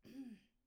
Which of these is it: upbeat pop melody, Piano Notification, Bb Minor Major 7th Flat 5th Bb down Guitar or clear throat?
clear throat